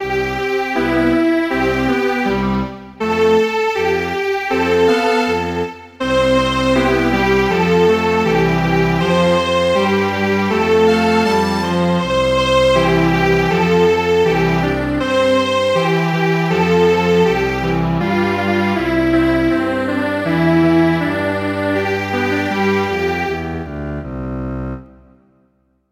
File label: Strings Quartet